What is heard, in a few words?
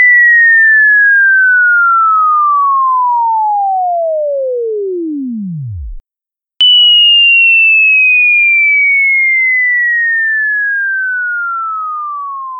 Bombs Away